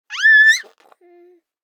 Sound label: BABIES SHOUT Girl 12 months Very High to Low; Baby Boom